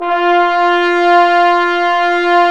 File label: BRS BONES, Bone Sec; Roland L-CD702